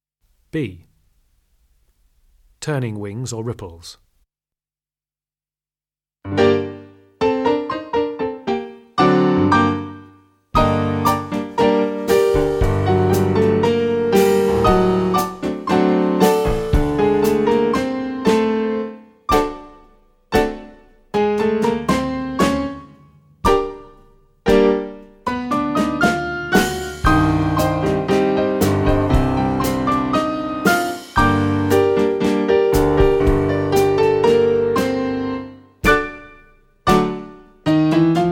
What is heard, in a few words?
Turning Wings or Ripples, tap; tap advanced 2